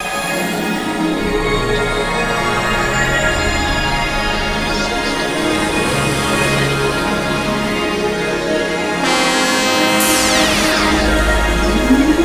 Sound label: synths; datafruits